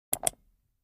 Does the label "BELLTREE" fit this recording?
No